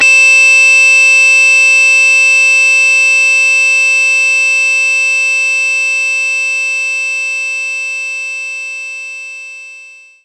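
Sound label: Guitar Harmonics Ab3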